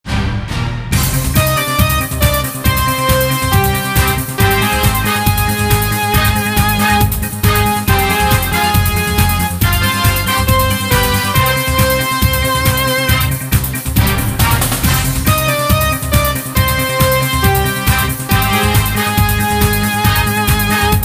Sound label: Radio Jingle